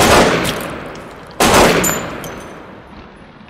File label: gun shots; Crash Bang Boom